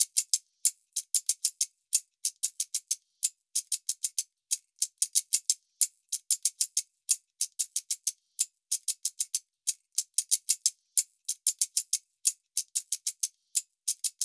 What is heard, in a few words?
shaker 093, Hip Hop India; 093bpm; Hip Hop India Modern Percussion; Apple Loops